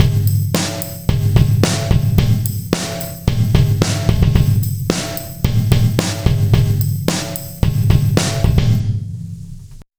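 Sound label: Distortion WET; Motortown II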